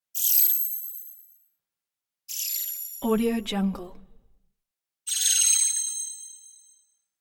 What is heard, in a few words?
magic twirling small high pitch spinning chime